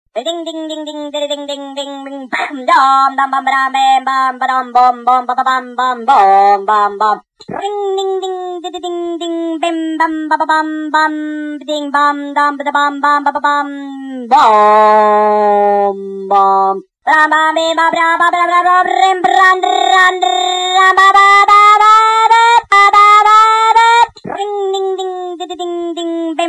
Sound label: Honda Engine Speedy